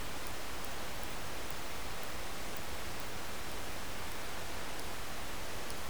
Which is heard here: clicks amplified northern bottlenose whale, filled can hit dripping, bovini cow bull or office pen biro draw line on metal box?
clicks amplified northern bottlenose whale